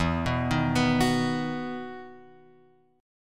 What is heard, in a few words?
E Major 7th Suspended 2nd; EM7sus2 Chord {0 2 1 X 0 2} arpeggiated